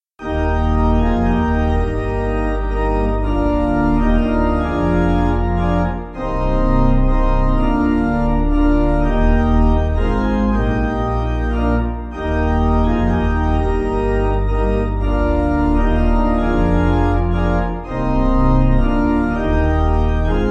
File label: Pipe, church music